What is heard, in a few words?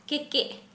Kharia bowstring